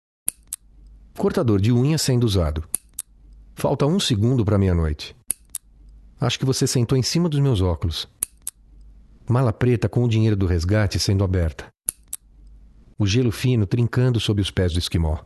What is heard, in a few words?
NAIL CLIPPER